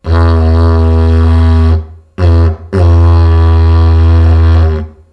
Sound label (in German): staubsaugerrohr